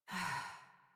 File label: Breath Relieved, Adult, en; Warframe; New War; Adult Operator